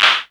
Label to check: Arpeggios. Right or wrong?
Wrong